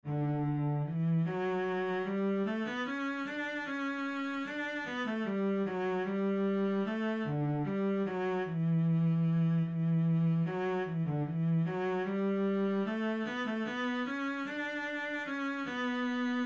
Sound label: minuet cello